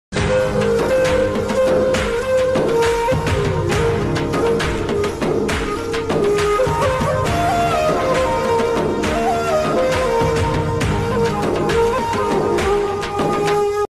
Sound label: heart touching bgm